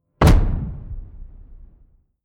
sci-fi detonation antiaircraft projectile

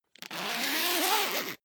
zipper pullover jacket open

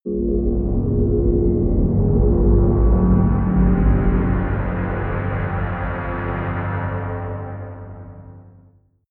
large hollow drone deep, drones

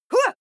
female fight attack shout short; schreie; menschen

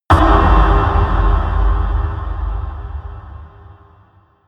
kick impact; hits explosionen; impacts

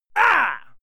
male fight ah; schreie; menschen; soundpool

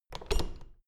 door plastic handle pull up creak

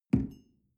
coffee place tablecloth soft

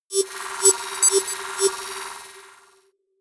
computer sound, signale; soundpool

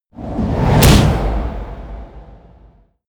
wham, whooshes

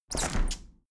window handle plastic squeak close heavy; objekte; fenster

